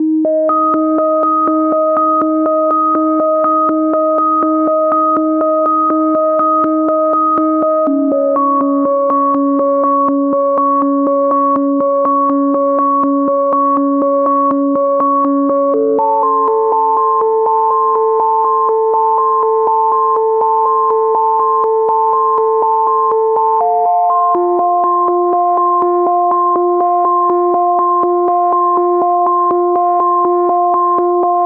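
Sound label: 122 BPM Melodic Arps B major G minor Tonic C; MMB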